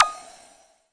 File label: residue hit; Weird and Interesting Hits; single hits